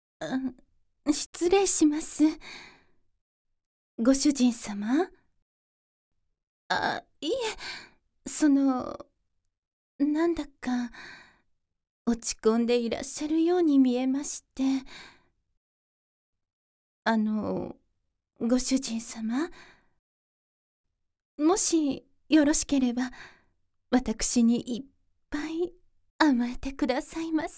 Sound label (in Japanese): じゅぽじゅぽフェラ; ママのクチマ○コでじゅぽじゅぽフェラ【ママ感重視】